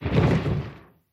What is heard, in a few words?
wings; rathian; dota; mh event